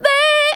vocals sung; Techno Trance Essentials

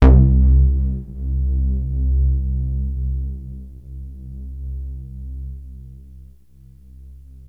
Korg MS-2000; Synthworx

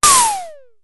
Snare, Drumfire DF-500

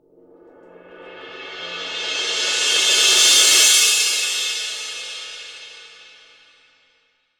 22 ROL, 22 ZIL MLT

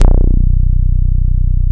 ELASTIQUE R, Bass Analog & Synth; USB Soundscan vol.12

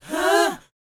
BOYZ, Vocal, R&B Dance

